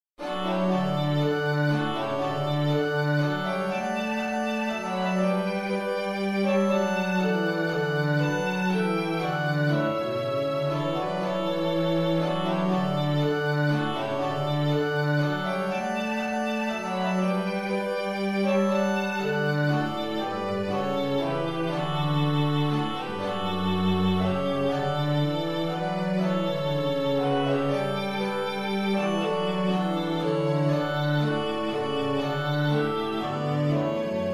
for quartet

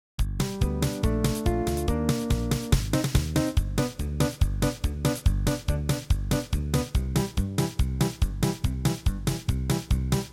uptempo, fiddle; down yonder

